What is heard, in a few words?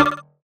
Perc